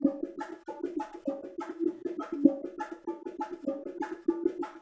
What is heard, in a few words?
Bongo